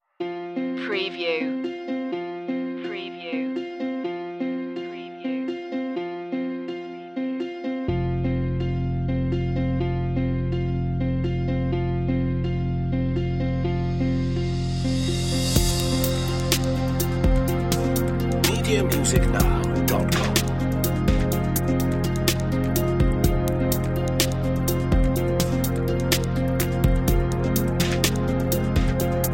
no arpeggio

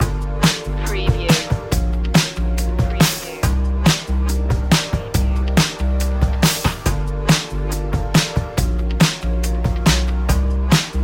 chill tide

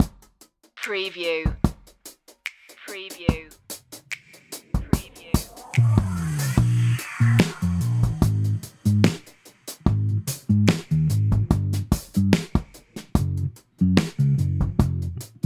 drums and bass

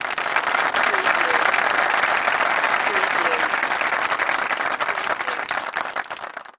Crowd Clapping

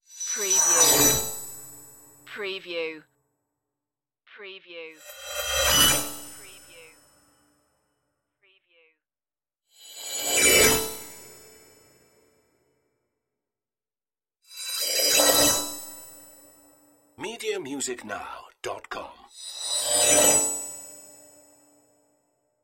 magic shimmering whooshes

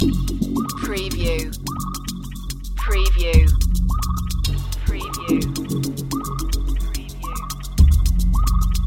loop, chill

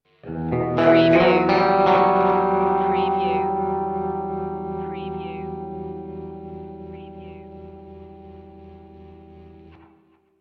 swamp swagger stinger

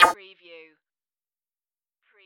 zap; Button / Multimedia Sounds

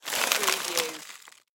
Potato Chips Bag Dump

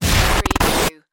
Stinger; Sound Effects Bible

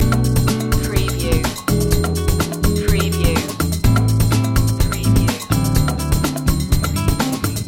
latin shake loop

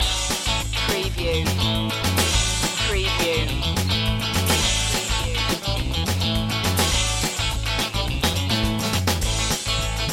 alligator stomp loop

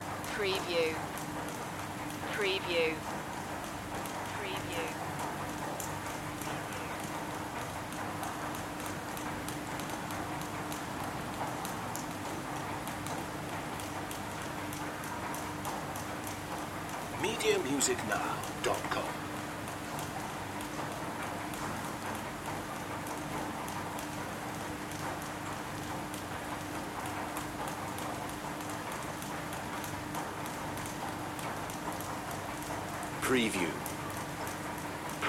escalator and raindrops

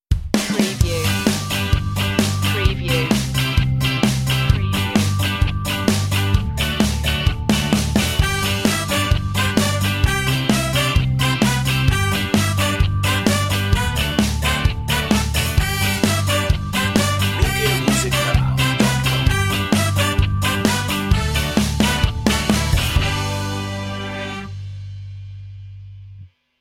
ska pop

